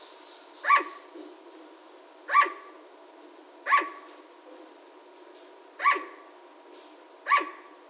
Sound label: Petaurus breviceps